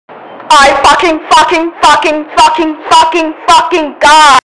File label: dizzy screaming; Memes